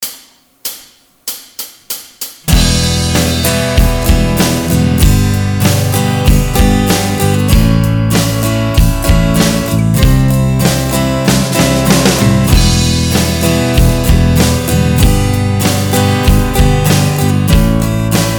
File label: Em 16 bar with fills